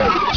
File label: DOOR CLOSE